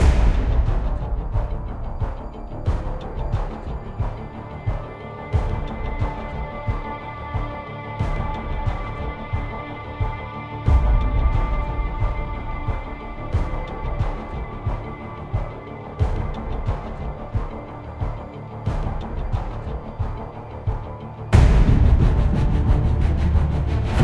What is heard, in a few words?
Epic Ambient Loop; Eisenhorn Xenos